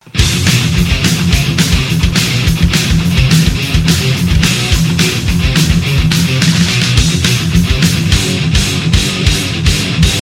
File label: humans wins; zombie plague